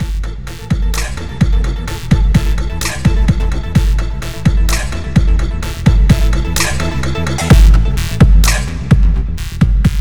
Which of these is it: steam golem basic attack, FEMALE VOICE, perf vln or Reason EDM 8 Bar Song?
Reason EDM 8 Bar Song